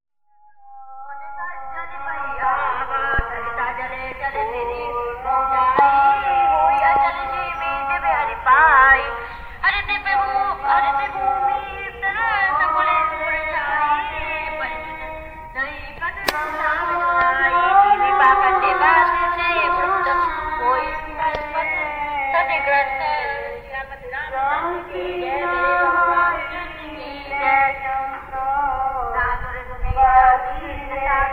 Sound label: morning multitrack chanting; radhakund